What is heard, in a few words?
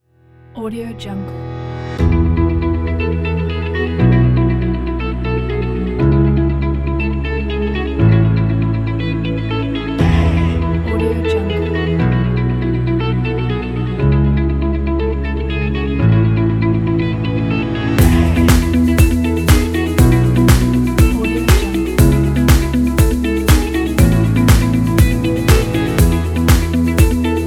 positive corporate